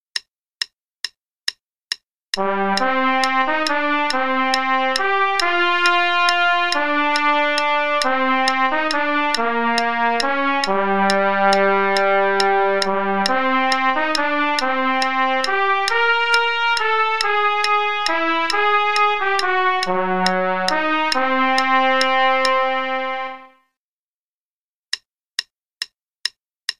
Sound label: Play-a-long, trpt only, en=140; trumpet